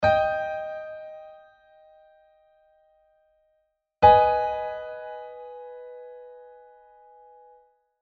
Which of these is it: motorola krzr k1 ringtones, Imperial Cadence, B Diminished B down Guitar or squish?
Imperial Cadence